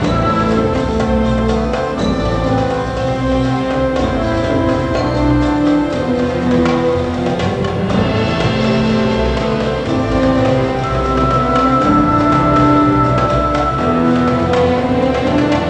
tabla; STARGATE

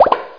pop; sony

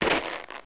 Snow Land, Footsteps

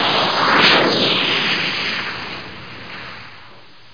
missle

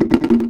Drop In; Hamsterball

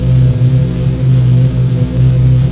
forcefield ambient; Army Men TiS

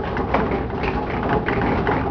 logs, effects; Pong

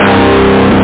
DISTORTED GUITAR

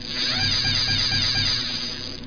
SPOOL, FISCHEN; ASC Mega 2

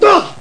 Karate; fightingspirit

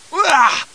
stun; Creative Review CD-ROM 28; kungfu

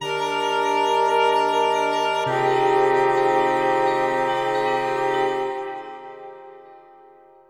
synth pad ambient 160 C; Sphere

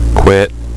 quit; Chat 2.1Pre10

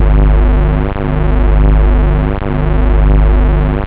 terror; OctaMED SoundStudio V1

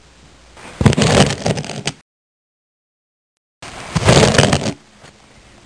Ripping

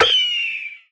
vulture lose; GoldMinerJoe

